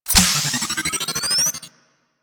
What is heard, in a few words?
Experiment Rifle Reload; Crp Sent Experimental Riffle